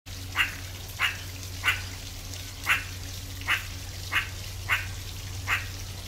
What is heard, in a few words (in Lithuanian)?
surikata